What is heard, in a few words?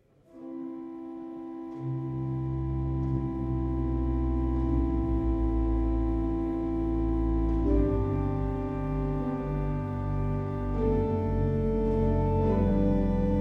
crescend decrescend, orgel